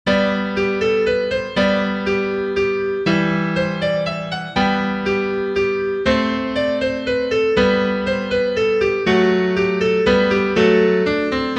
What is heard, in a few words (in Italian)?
Minuetto in Sol Maggiore; Bach, J. S.; Barocco